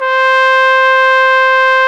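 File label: BRS, Brass Trumpet f; Roland L-CD702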